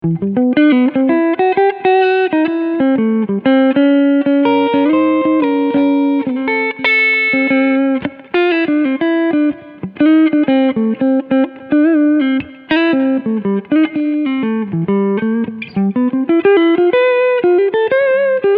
Front HB Clean

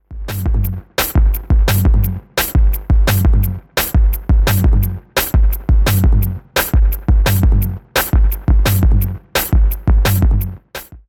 Envelope Noise; AudioThing Hainbach Bundle; Wires

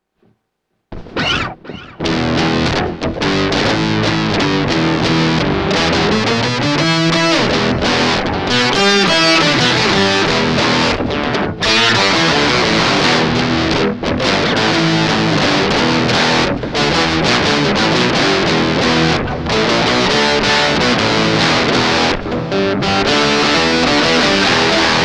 bass DRIVE and OCT

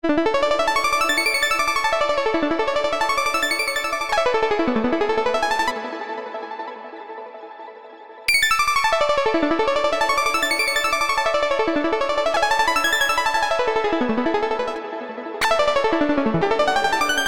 80s Dream Transition; Neural DSP Archetype Rabea; Overlord

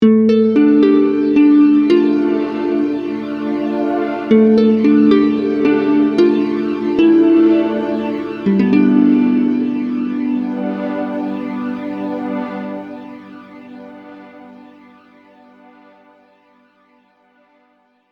Harp Heaven

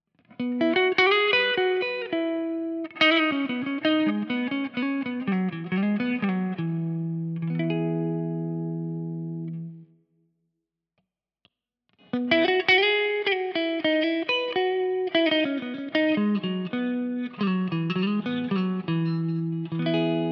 Jazzmaster clean Bright Melodies

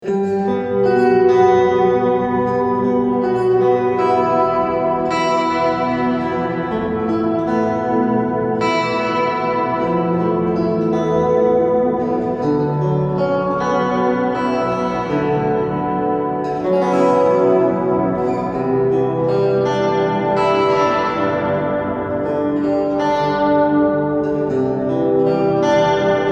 Texture LINE

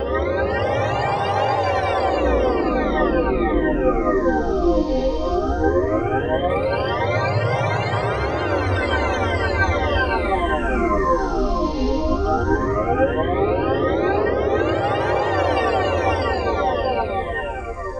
Sweeper Modulation Effect Phaser 40 Stages; Reason Studios Reason 11

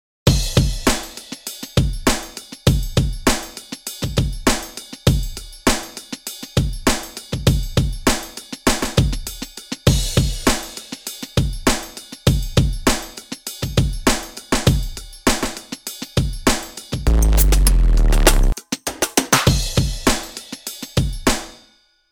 Kit Groove Drum N Bass

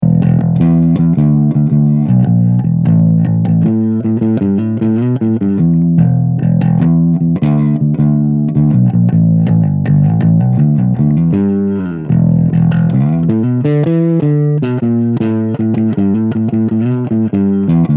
Creamy Rock

